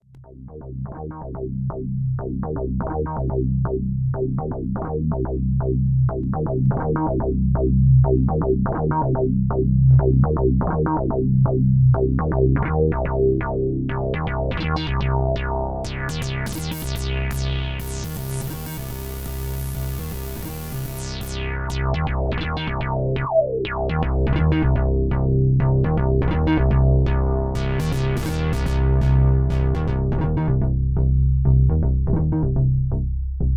MICROKORG; Peanutbutter bass; Synthstuff Korg MicroKorg

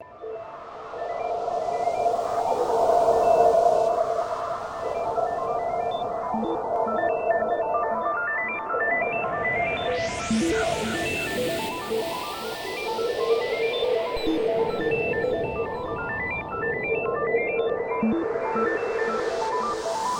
MICROKORG; Synthstuff Korg MicroKorg

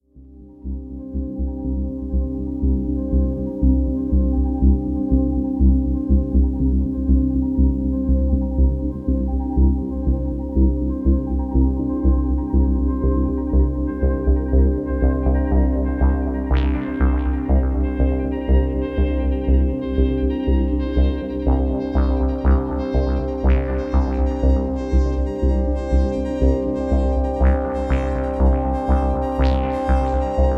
Hohner KS49 + Doepfer DarkTime Sequencer

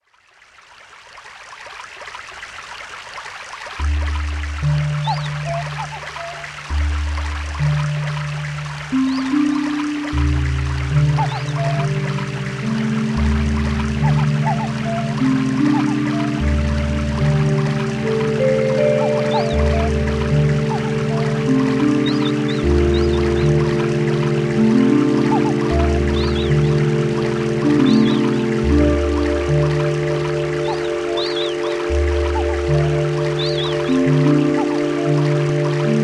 MT540 forest

